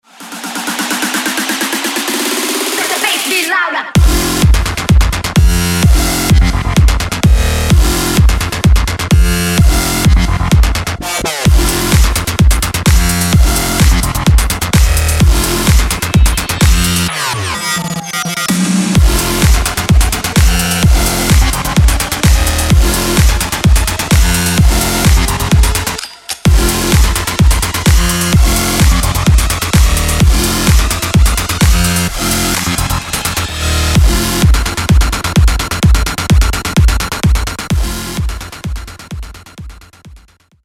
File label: Electro EDM Festival